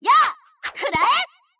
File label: double slash; mystical ninja starring goemon